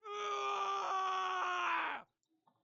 scream pained

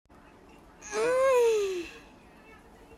pressure ahh

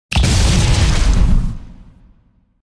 civillian explode

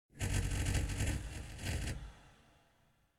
power outage

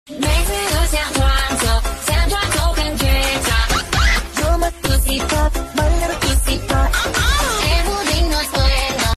verry relaxing

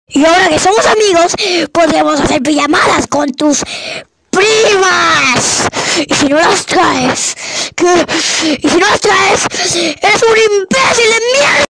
gasp

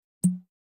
os x bottle

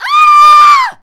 scream

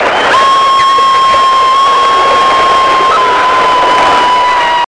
pidgeons scream; marv

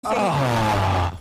arghhhhhhhhhhhhh

